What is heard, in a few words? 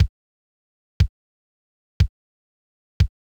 626 Kick; hydrogen